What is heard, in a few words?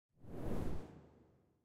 swoosh zoom out; oneshot